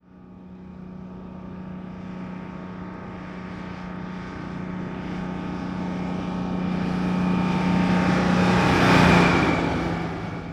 1948 Diesel Truck Ext Fast By; Period Vehicles

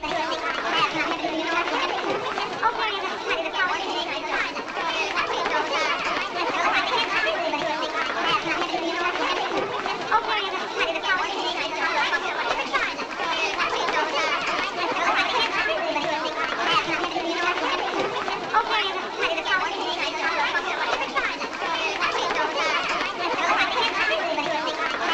Hi Pitch Crowd Walla; Vintage Cartoon; Comedy Vocals

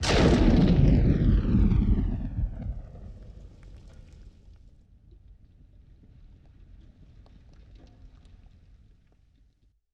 WWII Explosion With Debris; Period Combat

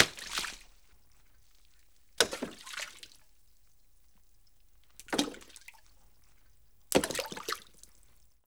Splashes Flat Hits; Natural Elements

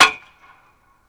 Hockey Puck Goal Post Hit; Sports & Recreation

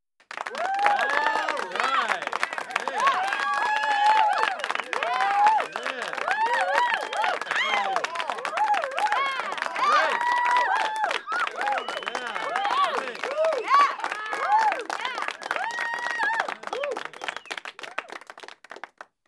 Crowd Applause Cheering; Vocals & Wallas

